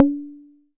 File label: Jomox MBrane, drum machines; Jomox MBrane 11